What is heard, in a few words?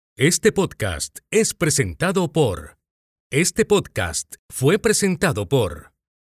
intro outro podcast; Gowrishankar Podcast Testing